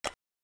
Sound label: quick soft flip